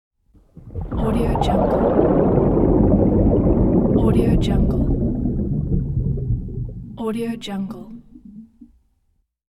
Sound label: slow motion underwater bubble release; cartoon